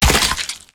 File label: Teleporter Super Nova Blood Splat; Evolve; Wraith